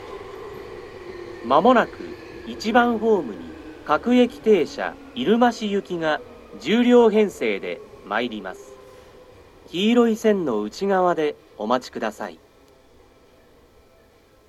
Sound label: hoya local irumashi express hanno, seibu station